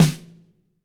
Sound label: Snare, Roland TD-20